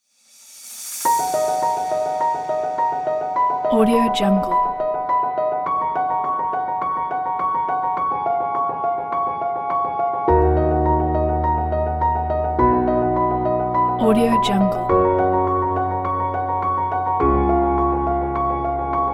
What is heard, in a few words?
new epic pack